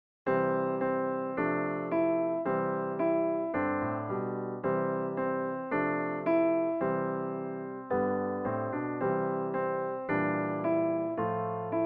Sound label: 12-TET 2ND 9-8 4TH 4-3 7THb 16-9